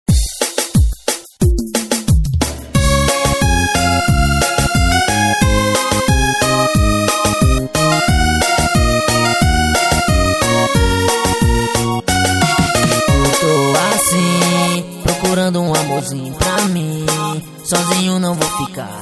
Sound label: melody